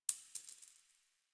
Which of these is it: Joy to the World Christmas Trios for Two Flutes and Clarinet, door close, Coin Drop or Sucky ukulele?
Coin Drop